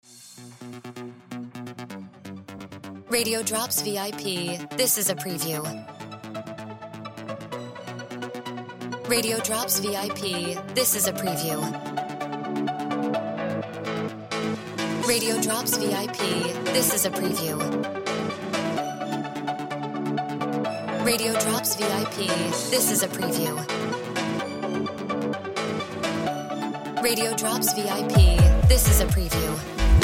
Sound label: Music Bed